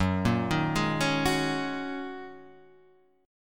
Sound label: Gb 6th Add 9th, Guitar Drop D; chordshape; down